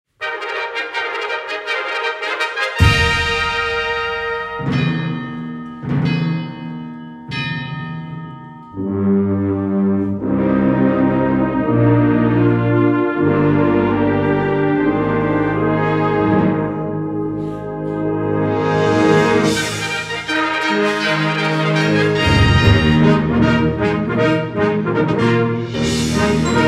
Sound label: Fanfare